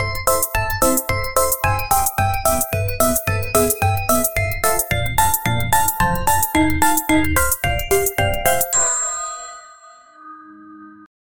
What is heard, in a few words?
ring